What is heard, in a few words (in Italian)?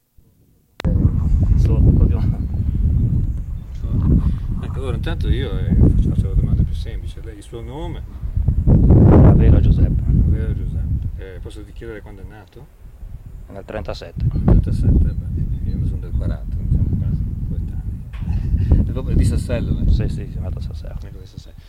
Campane; Sassello; Savona; Liguria; Archivio Balma Campane Liguria; Polifonia